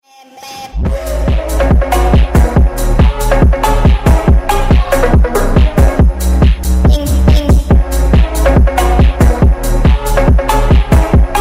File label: Crazy Phonk Remix; ringtone